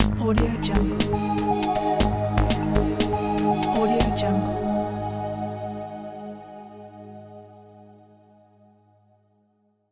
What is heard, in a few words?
bell logo